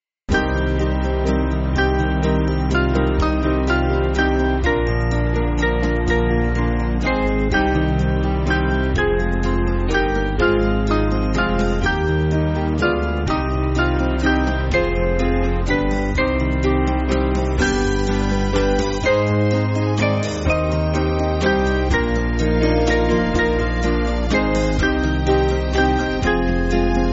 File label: Band; 6/Cm